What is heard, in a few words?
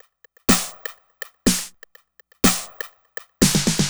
Electronic, Drums & Percussion; Sonic Foundry (Sony Creative Software) - Electronic Point Blank